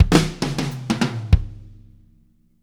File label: Disco 100, Fills; Zero-G; Monster Beats